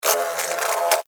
Wasp Trapped, Wasp, Trapped, Inside, Container, Wings, Fly, Buzz, Short, Insect, Bug